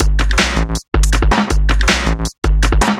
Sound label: Funky Beat, Drum N Bass